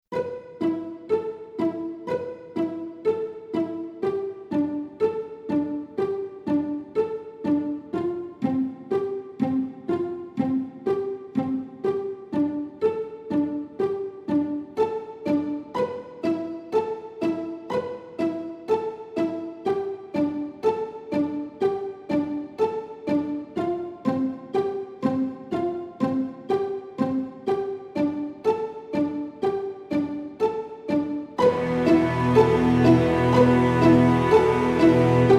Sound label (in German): streicher